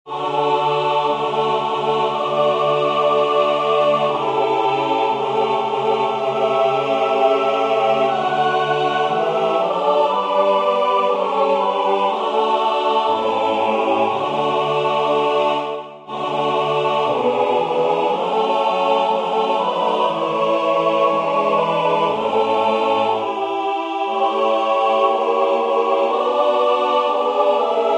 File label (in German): Heilige Nacht Tutti